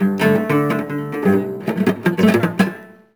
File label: Cello Strum Badly; SFX Kit; Foley - Misc